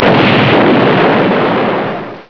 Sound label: explosio, volcan